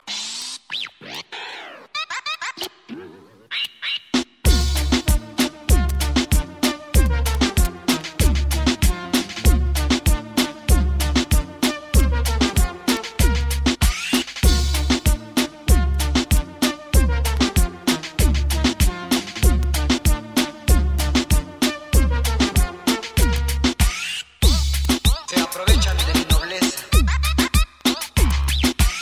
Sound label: Reggaeton